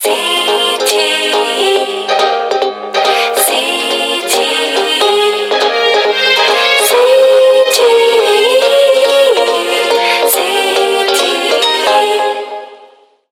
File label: Singing RingTone; ZTE Open C; ringtones